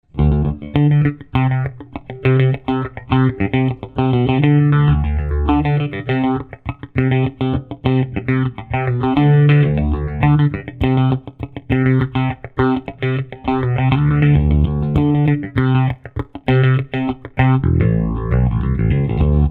PHASOR II; musitronics phasor ii

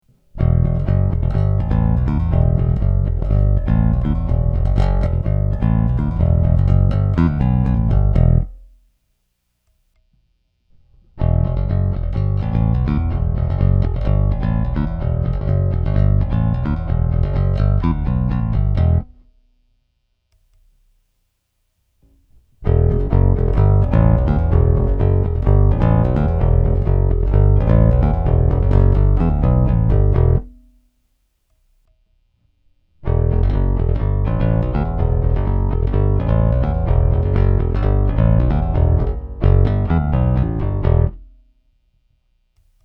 bass cut active passive; ric inspired five string

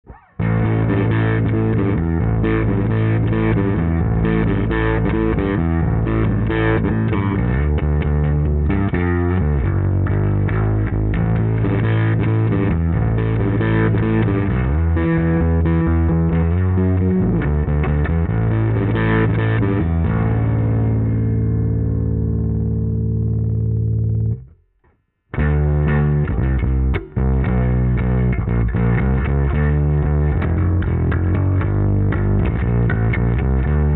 SVT7 pro P bass max dist; streamliner 900

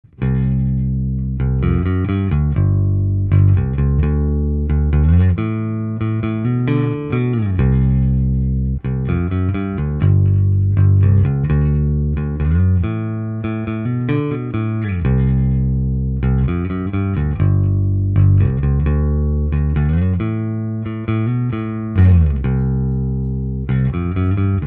p bass vs j bass

